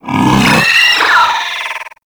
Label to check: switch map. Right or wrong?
Wrong